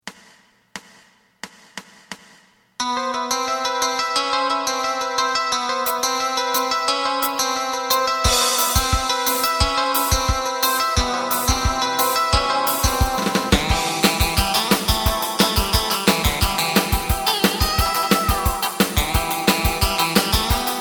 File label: bass